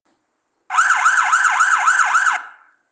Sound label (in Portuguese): wail rapido policia; sirene alta potencia incendio; 27C10 NOBREAK